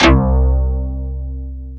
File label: DS8 C 1, Korg; Back In Time Records; Korg Universe VOL-1